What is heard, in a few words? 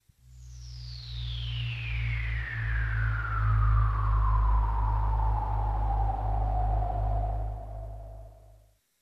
UFO; 効果音素材